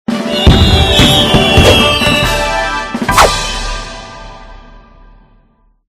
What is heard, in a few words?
Congratulations!; Cookie Jam; android ios mobile online windows